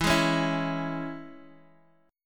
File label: E 6th, down, Guitar, Half Step Down